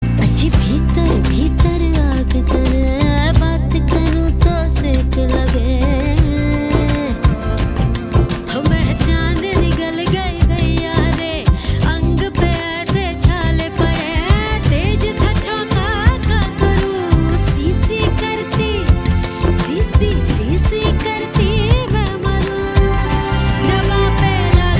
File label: Ringtone